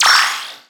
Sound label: se jump